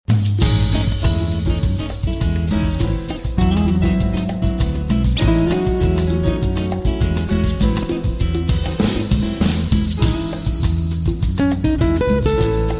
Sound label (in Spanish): Samba; honduras musical 9no grado